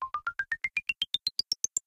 music menu loop start; Adventure Time - Angry Betty; ThrillGames

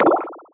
Bubble Blaster; Crash the Bash - Drink Break

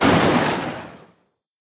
Lightning Pickup; Crash the Bash - Drink Break

